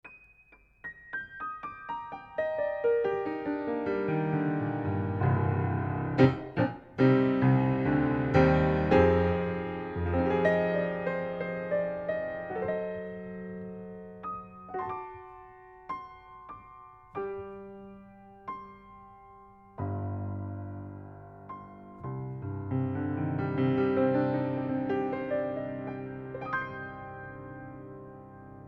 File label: Piano, Front To Back Mic